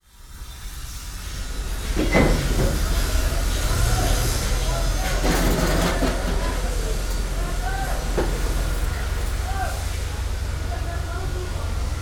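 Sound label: sounds of construction and repair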